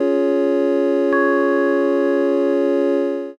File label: church